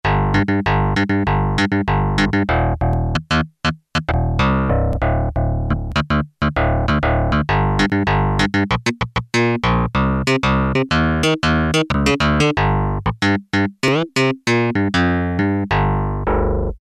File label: Elec Bass, Yamaha TX81Z